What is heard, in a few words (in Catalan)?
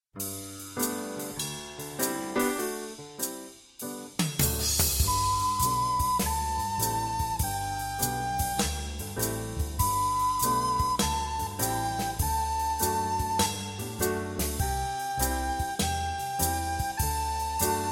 Jazz Doinua amb flabiol